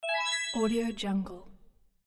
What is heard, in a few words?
game success